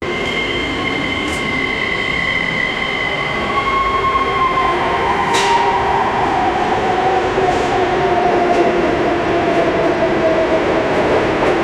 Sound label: sound of subway train arr